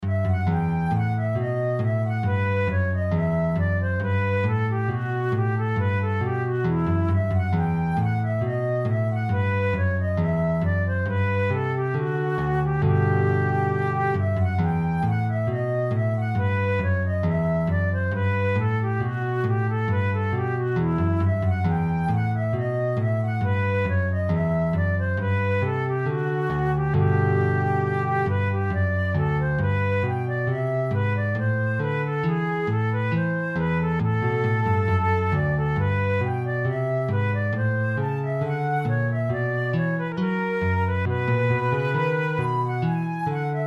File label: bach lute suite no1 bourree, flute